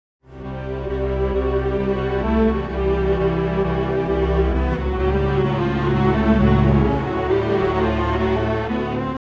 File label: strings; pitchshift